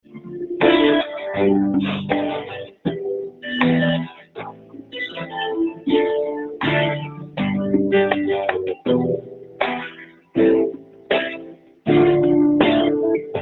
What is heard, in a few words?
Bank Hold music; Zla jakosc